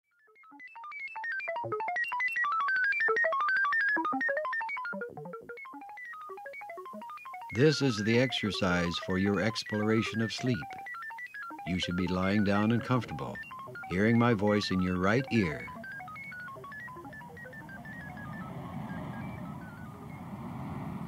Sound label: gate